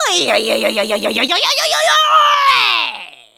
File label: Struggle; Oren (Zora Queen) struggling in her pool; zelda